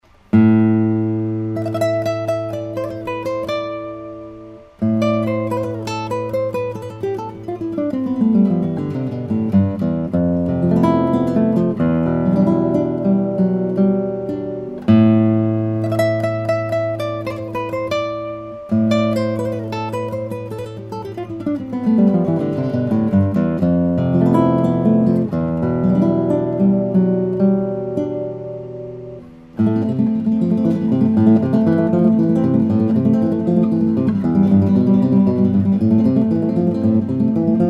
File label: tacchi bouchet homage, nylon-string classical guitar